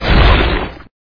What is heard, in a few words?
magic earth